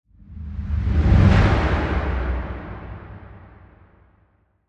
Subtle Flyby

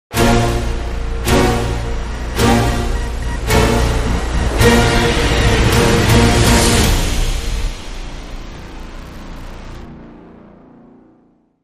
Dramatic Sting

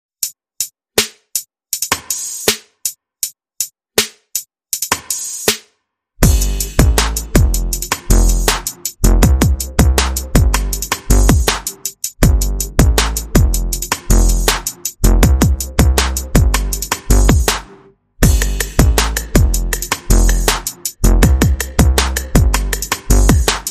Bass Perc and FX Only

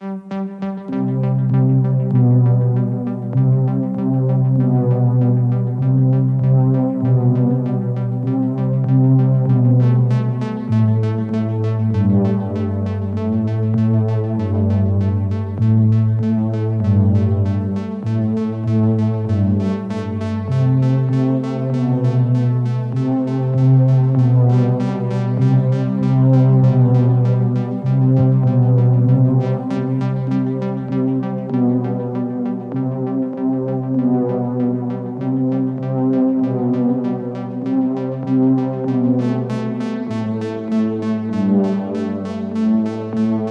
Synth

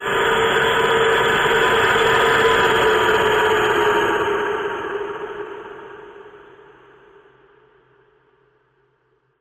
Gravity Shudder Flutter

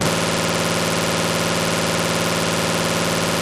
glitch

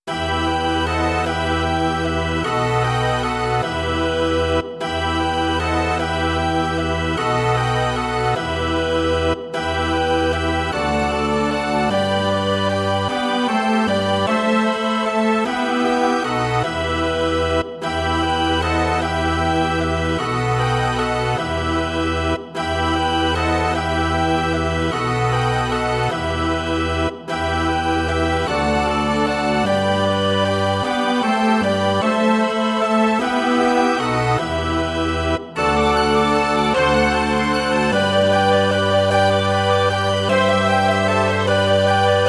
Carousel We Three Kings, No Drums Mix

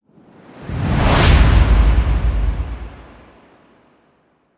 Santa Sled Whoosh Hit

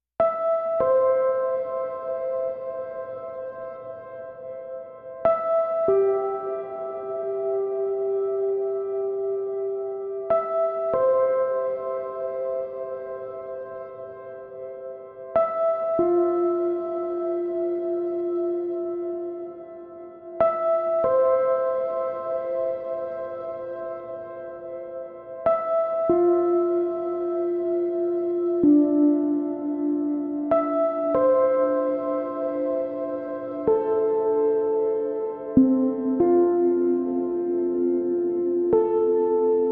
Keys

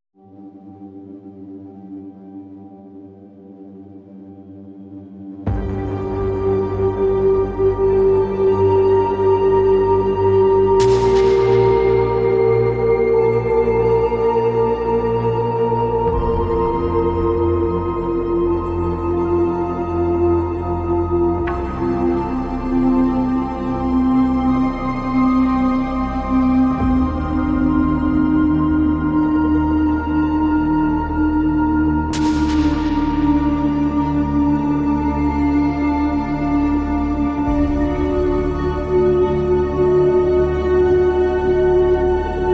Distant Storms

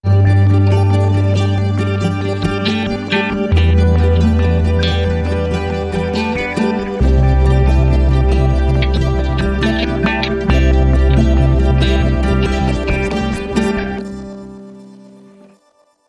First Triad Sting

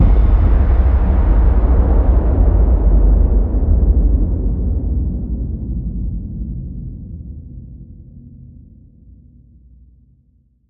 Hit Low; Soaring

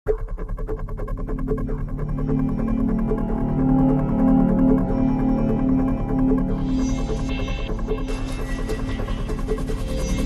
Dramatic Sting, Synths

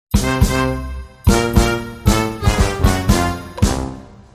Bierhall Polka Sting

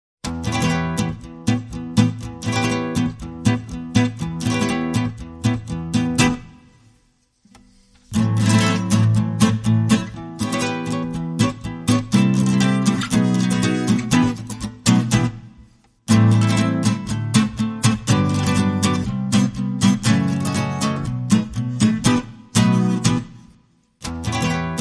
Guitars Only

